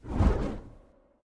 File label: wingflap; smite; thanatos